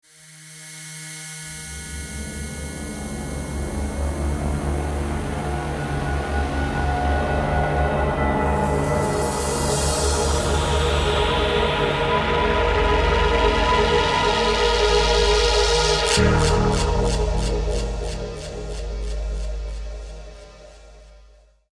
slow fade stinger

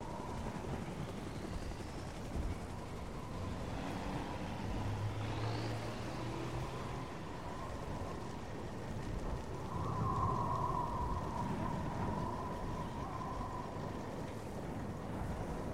Ambient - Qatar; Transformers The Game

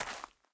Step Light; endless world idle rpg gamerip 2019

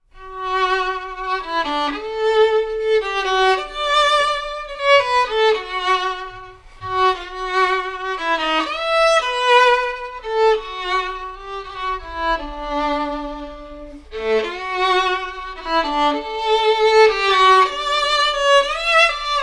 Violin; Assassin's Creed Syndicate

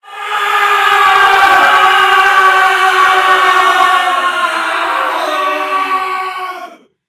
Screams; don t be afraid the first toy; Second Floor

